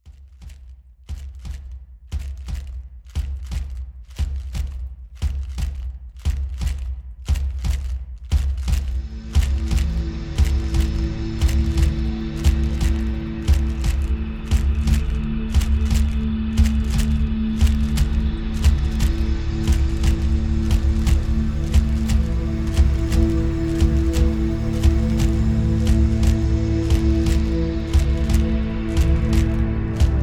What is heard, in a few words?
drum spirit